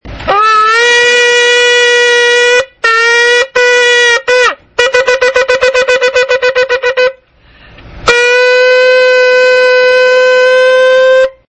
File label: ringtone